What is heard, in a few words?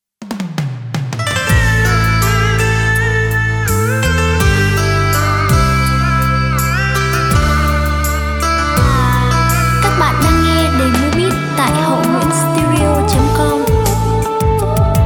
Le bong beat